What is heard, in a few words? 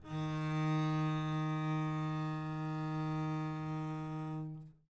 eb3 down, bass arco; meatbass